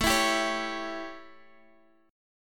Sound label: A 7th A, Guitar Open D; chord